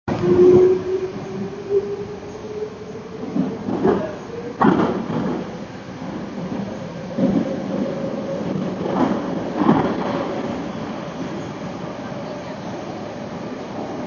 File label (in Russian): Метро с Шумопод; Sony; MosMetro